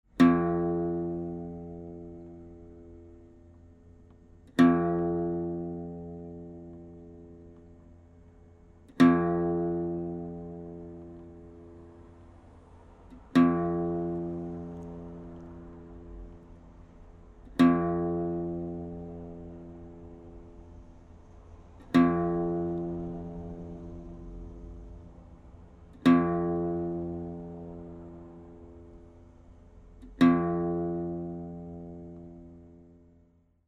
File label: Papoose single Note 18 inches